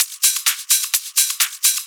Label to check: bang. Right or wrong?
Wrong